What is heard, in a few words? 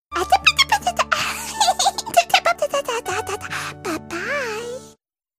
baby laughing